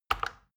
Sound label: plastic button tiny click regular fast